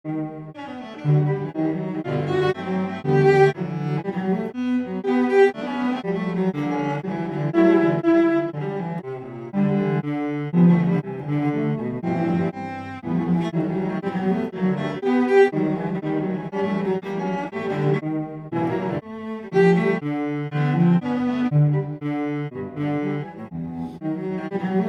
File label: classica